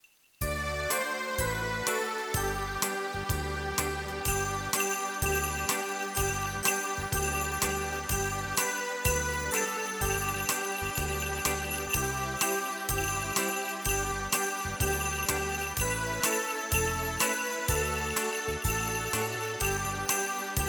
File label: Jingle Bells